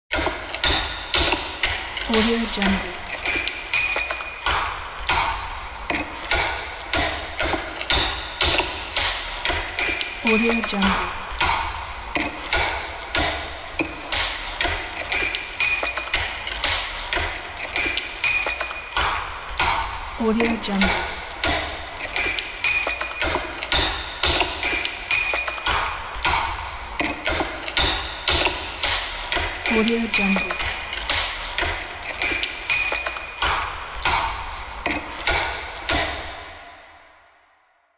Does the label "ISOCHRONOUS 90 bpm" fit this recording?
No